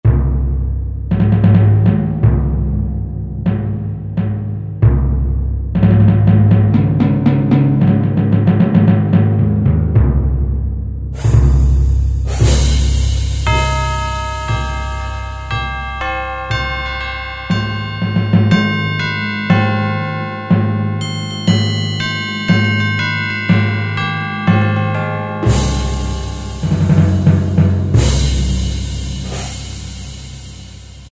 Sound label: Orch Perc, Korg N364; Factory Prog demos